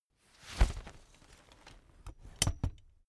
umbrella big open full soft

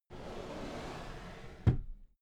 drawer cabinet equipment close soft

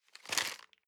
plastic bag small grab medium

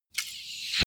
shot fly approach

